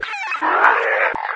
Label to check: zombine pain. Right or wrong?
Right